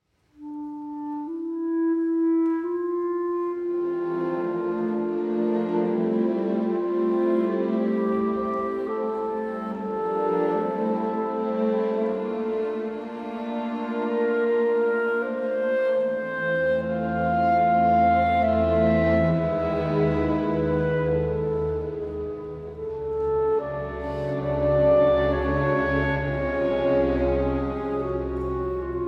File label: Clarinet and String Orchestra